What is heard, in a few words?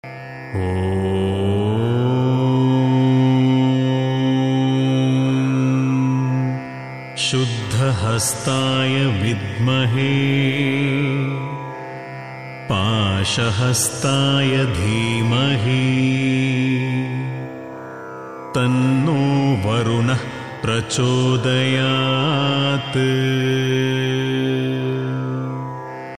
varuna gayatri